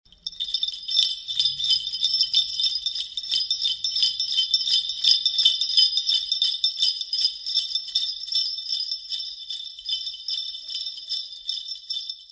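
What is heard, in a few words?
sleigh bells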